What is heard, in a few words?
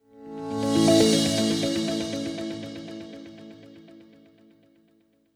Corporate Transition